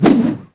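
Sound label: Attack One Mace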